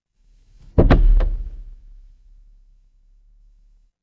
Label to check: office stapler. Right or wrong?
Right